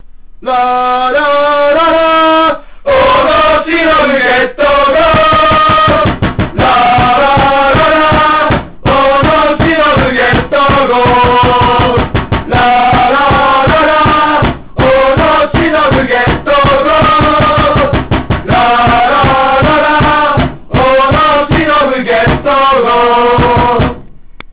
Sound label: song